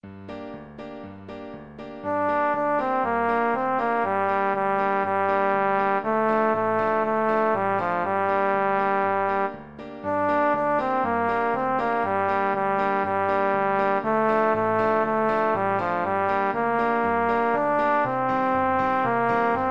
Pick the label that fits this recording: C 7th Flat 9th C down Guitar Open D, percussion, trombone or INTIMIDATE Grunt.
trombone